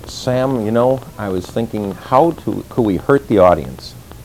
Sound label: hurt